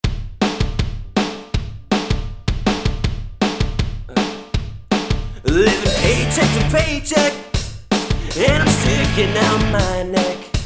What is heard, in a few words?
D rock vocals